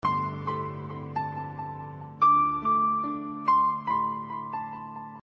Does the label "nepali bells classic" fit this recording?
Yes